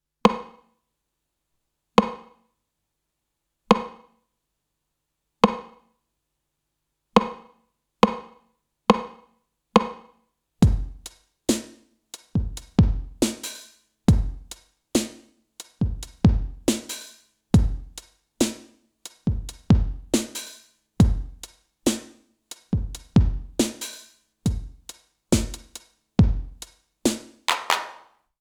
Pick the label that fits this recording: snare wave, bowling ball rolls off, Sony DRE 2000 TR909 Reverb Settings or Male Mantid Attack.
Sony DRE 2000 TR909 Reverb Settings